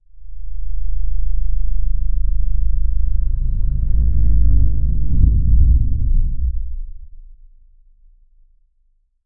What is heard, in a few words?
cinematic fx downer sound